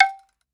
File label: block; eddyflux